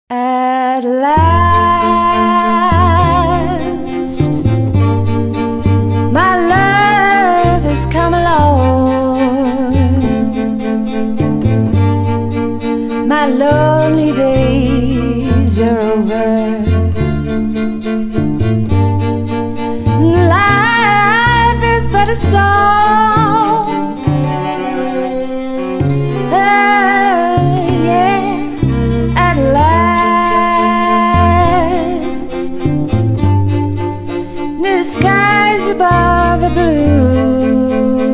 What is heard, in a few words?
Quartet Song